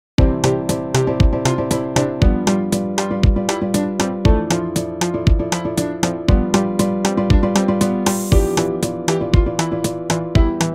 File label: bossa, インストゥルメンタル; 穏やか